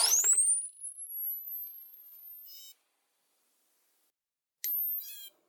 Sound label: camera canon eos 3000n flash charging; mono; SoundHolder; Cameras